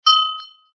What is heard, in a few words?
ding sound; minecraft